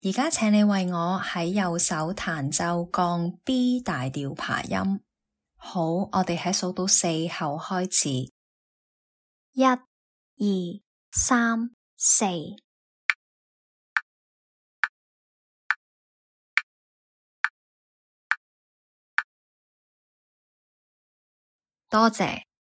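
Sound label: B flat major arpeggio right hand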